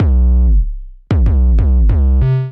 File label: Boom Drone B Minor 95 bpm, Bassline; MIDI Clips; Tonal; Tunable; Ableton; Factory Packs; Chop and Swing